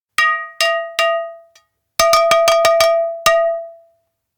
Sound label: pot tap; anemoi